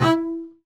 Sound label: SPICCATO, ARCO BASS; Northstar - New Gold VOL-2